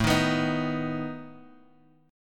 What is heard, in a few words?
A Suspended 2nd Flat 5th A down Guitar Drop D